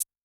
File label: Maracas; Yamaha DTXpress-II; Perc